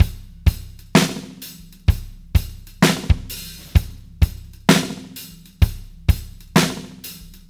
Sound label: 064, Beat Loops; Buffalo; Zero-G - Monster Beats